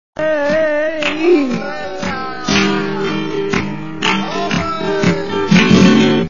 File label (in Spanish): buleria SLOW